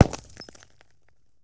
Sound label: Bullet Into Windshield; Sony Pictures Sound Effects Series; Ricochets - Hits - Whiz Bys